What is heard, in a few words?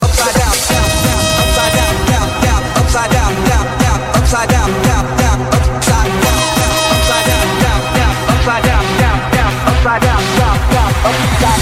trance ringtone